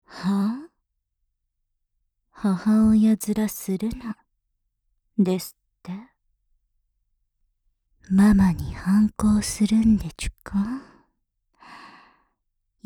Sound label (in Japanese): 両耳舐めフェラ抜き; わからせ調教; SEあり